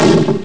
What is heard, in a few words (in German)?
low schloss hit; Amiga Soundtracker Sample Packs